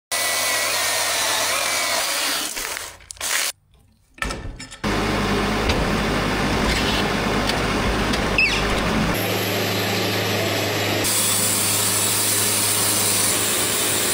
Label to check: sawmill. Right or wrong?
Right